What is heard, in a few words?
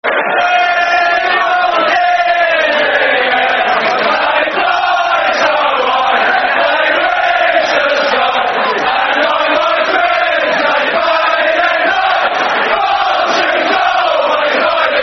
Football Chant, fanchants, chants; West Ham WHFC